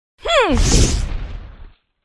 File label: mage female attack voice bottom